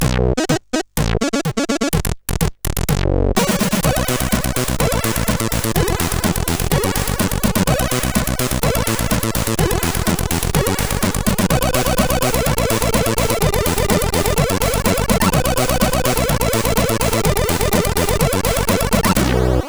Fast Menue, sid CSG8580R5

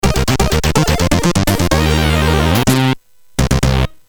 Jingles, CSG8580; CSG8580R5; hvsc

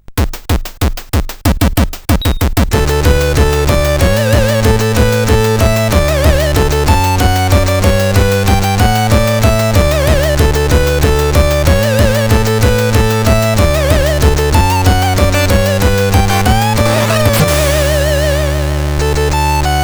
Happy Birthday, sid CSG8580R5

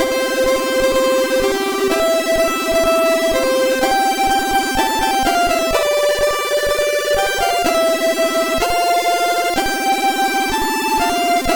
Happy Birthday CSG8580R5; hvsc

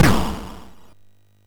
SFX, MOS6581; Narco Police

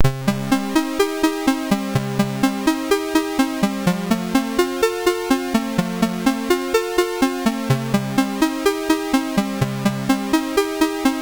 MOS 6581; Shout 1 tune 2

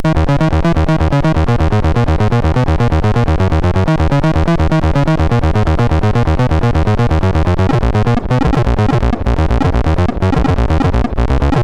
sid MOS6581R4; Warpzone

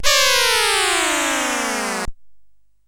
tape version CSG8580R5; sid

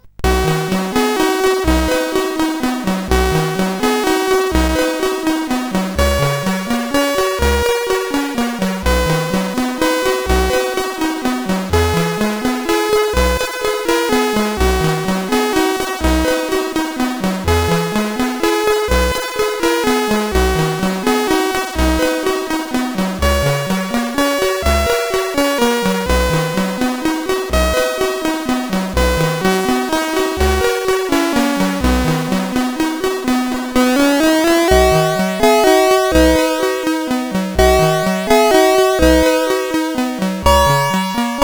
X-MAS Music, sid CSG8580R5

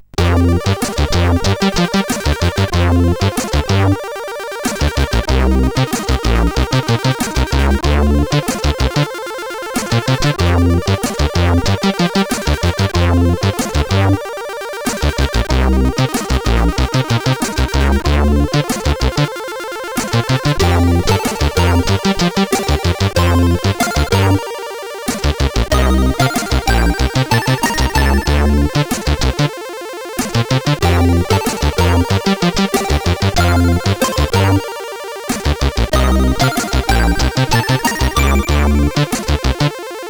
Dwarf Fly sid CSG8580R5

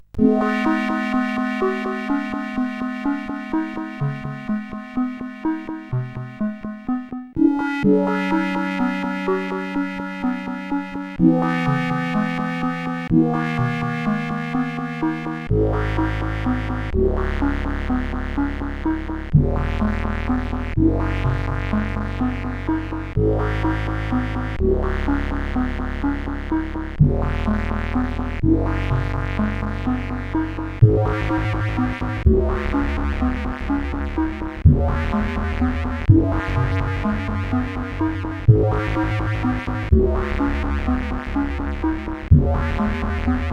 outro, sid CSG8580R5

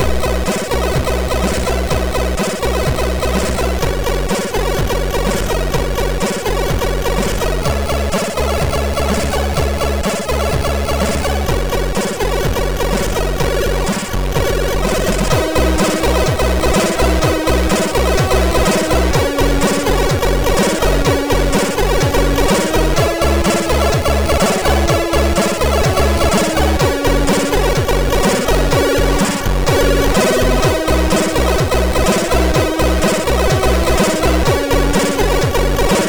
MOS6581; sid MOS6581R2; Lost in Space

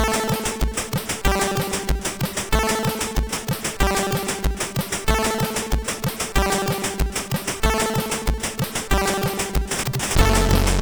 Dirty Bass, sid; CSG8580R5; hvsc

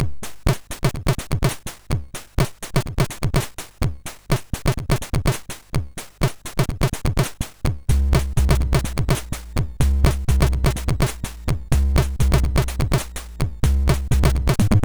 Chill, sid MOS6581R2

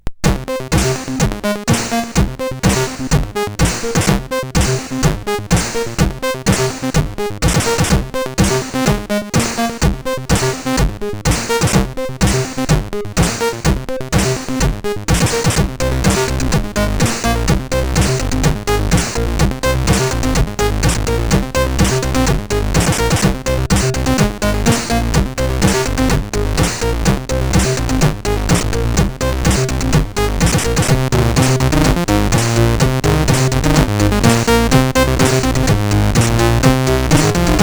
Ringmodulation, sid CSG8580R5

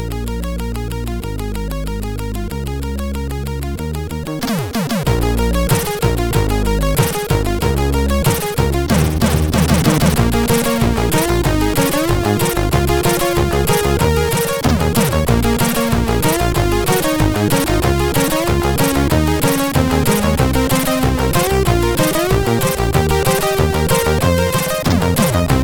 MOS6581R4 sid

